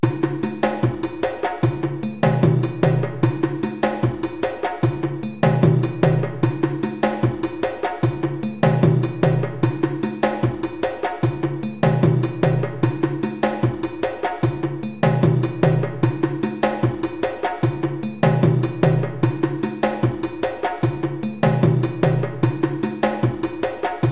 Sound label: Rumba Groove 150 bpm, djembe